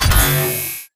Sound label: Zombie Stalker Initialize Beep; Titanfall 2